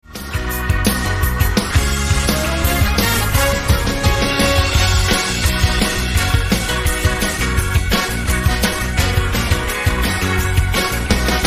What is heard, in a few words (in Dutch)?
instrumentaal